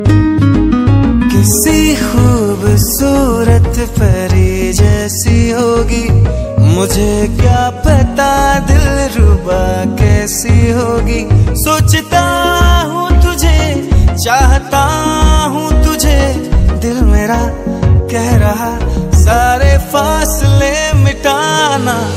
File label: Song Ringtone